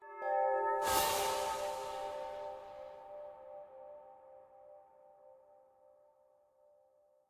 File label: Phone a Friend Selection; USA Clock Format; Millionaire Soundtrack; US Clock (2008)